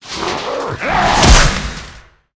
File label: dark phalanx attack, monster